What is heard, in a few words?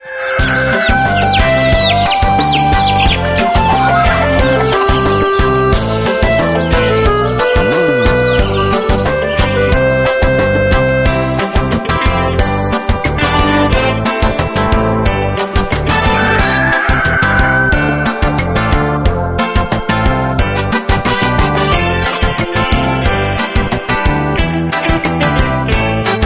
karaoke